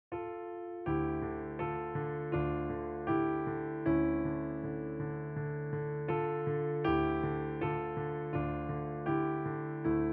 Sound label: scene change music; salvationarmy; Accompaniment Tracks